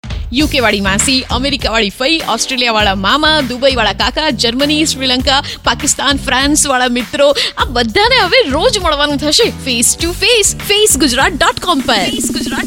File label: radio jingle; gujarat